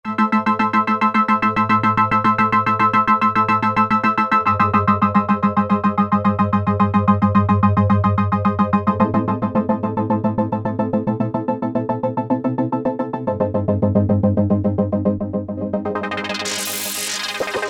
BPM Pulse, Roland JP-8000; Factory Patches

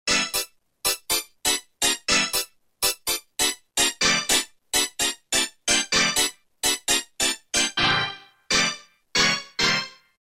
Sharp Hit; Roland M-DC1

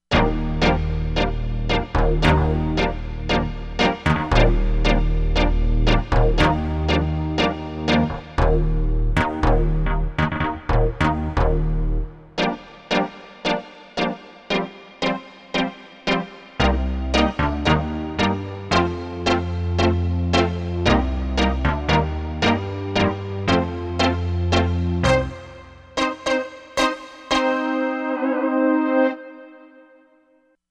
Synth Chiff, Korg MSC-07S Synth; Korg MSC-07S Synth 2

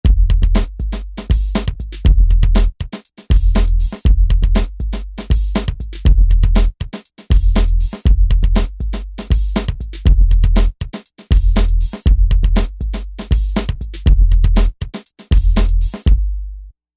Rap Hip Hop

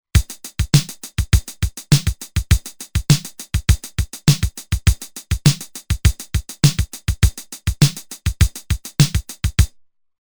8 Beat Rock, Roland TR-505; Factory Rhythms

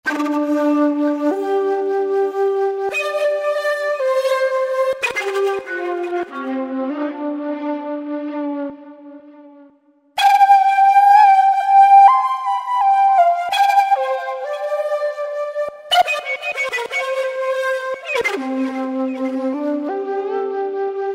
Roland V-Synth; Factory Patches; Shakuspear

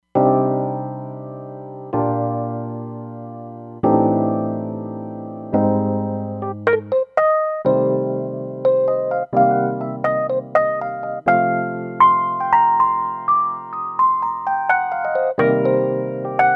Stage EP Trem, Roland Fantom XR

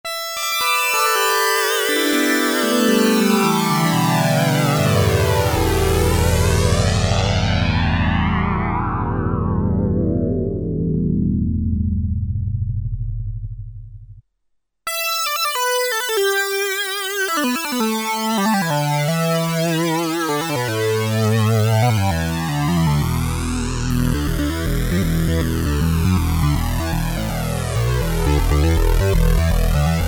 Lead Sound, Korg PolySix; Factory Presets Bank A

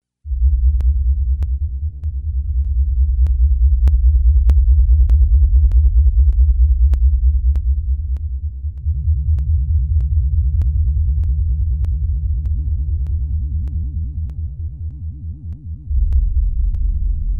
Earthquake

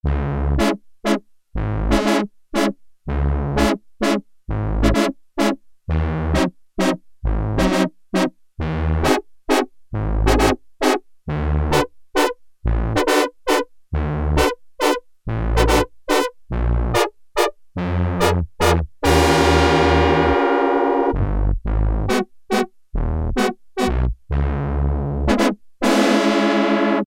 Om-pah Brass, Korg Poly-61M

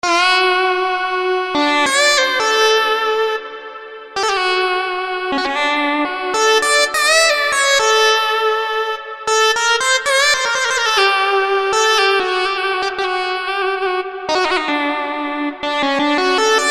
EWI Lead, Kurzweil K2000; SYNTHS; Kurzweil K2000 Value Pack; Extra Programs

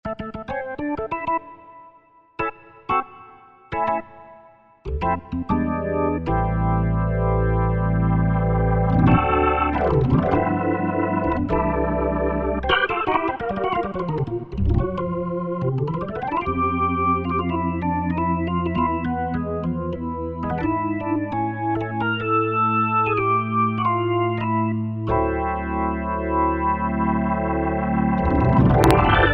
Rotary Organ, Korg TR-Rack

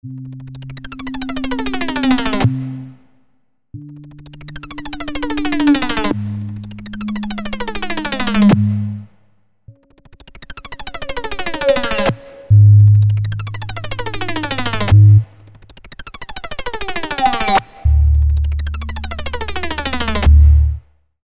Swell, Swells; Green Oak Crystal

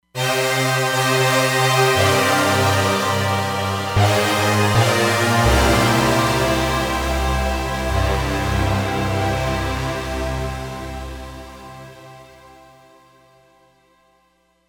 Syn Orch Mod, Roland Fantom XR

